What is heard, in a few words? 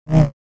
Saber; FXHome